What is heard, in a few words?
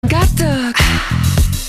Ahh